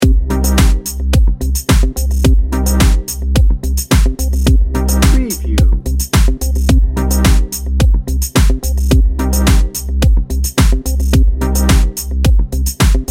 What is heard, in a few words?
fashion week loop